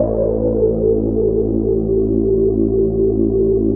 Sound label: ELEGY A2